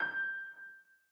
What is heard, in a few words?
Piano, Dm, Hit, Bright